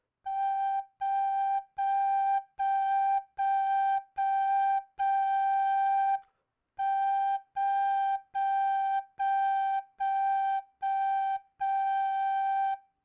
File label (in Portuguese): Exercicio Sol